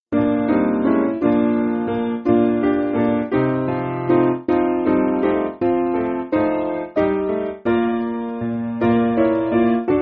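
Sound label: Piano, Backing Files for Churches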